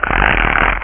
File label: CHAIN